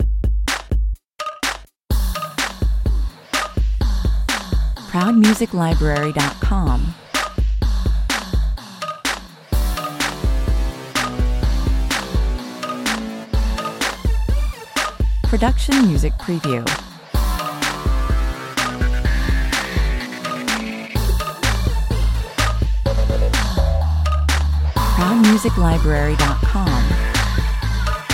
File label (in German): 126 bpm, Riffbasierender Pausenfüller mit Ohrwurmqualität